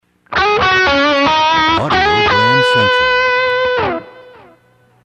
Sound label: Desert Wind, radio jingles